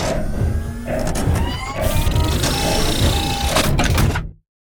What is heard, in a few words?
repair; CosmicRage; ships